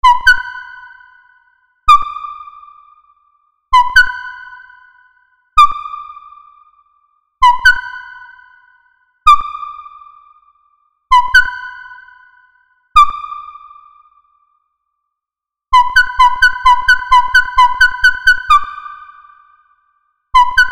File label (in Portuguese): PONTO ASSOBIO